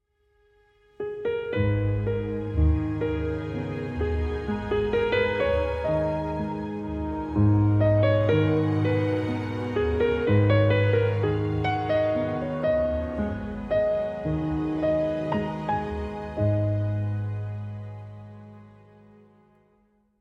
Dirge

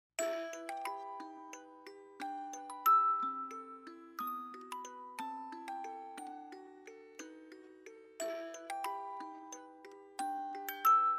Music Box

